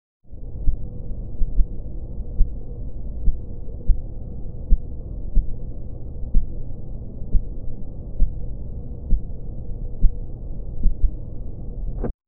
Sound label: subtle rustle of the; calming